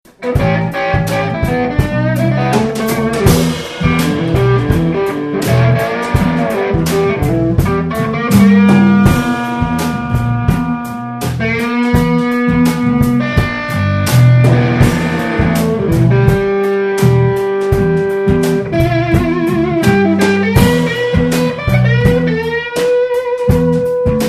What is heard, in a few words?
Slow Jam In E; ax84